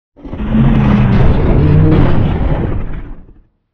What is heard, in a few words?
monster